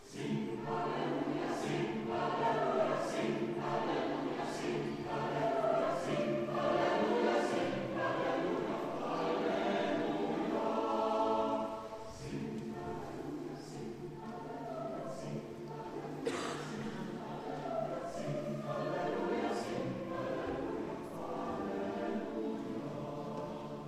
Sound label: Young Sing Alleluia